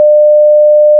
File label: stall continue, A-10, Aircraft; fgfs